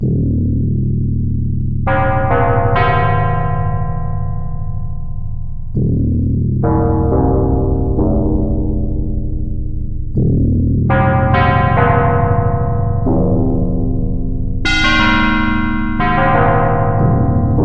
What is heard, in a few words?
pad metalpound, yamaha tx81Z